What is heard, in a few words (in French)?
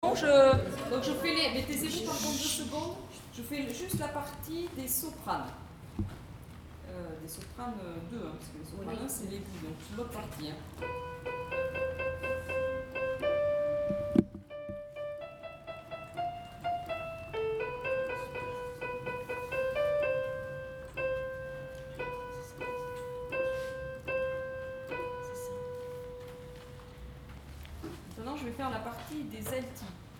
soprane; Chariot